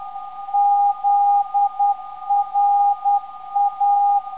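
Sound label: ZRA 329.5kHz, non-directional beacon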